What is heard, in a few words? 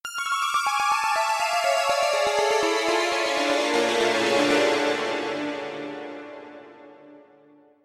synth piano 3 fat